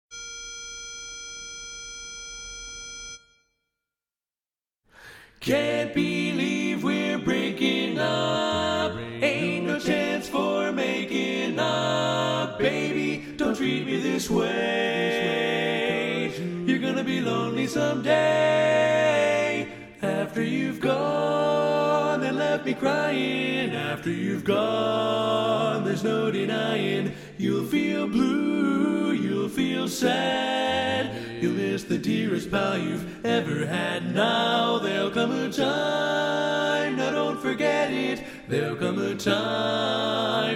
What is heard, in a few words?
Lead